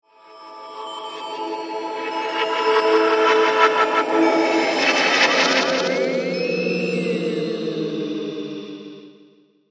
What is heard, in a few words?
Sweeper Swell, Production, Element, Sweeper, Swell; Sound Design